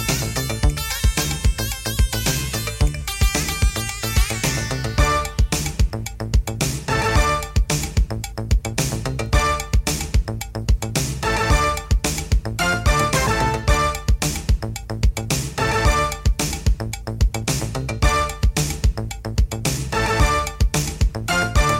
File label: Arabian Instrumental